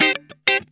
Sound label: SLICE, Funk Guitar; Zero G - Funk Guitar